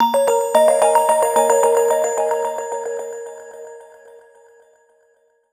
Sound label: Buckthorn, ringtones